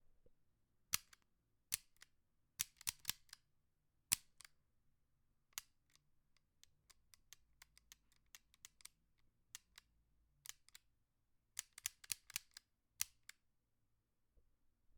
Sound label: Pulling A Trigger